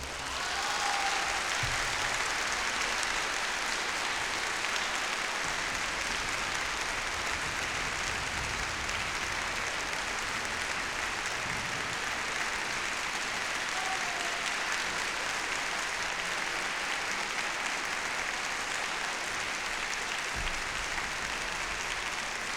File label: Applause